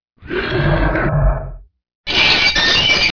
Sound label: animal roar; animals